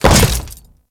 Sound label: Land Default, Footsteps; Enemies; Warframe; Grineer